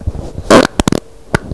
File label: fart